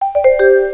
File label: chim